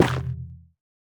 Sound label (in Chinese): 菌光体破坏声